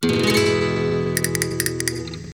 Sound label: Castanets